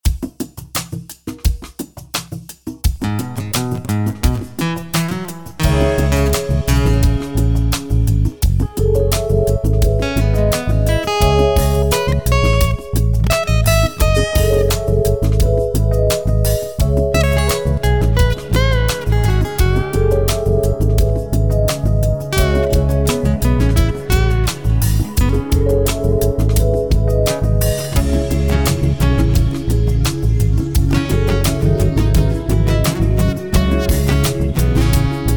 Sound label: Deep Chillout Backing for Guitar Key Cm Bpm 086; Guitarteamnl Jam Track Team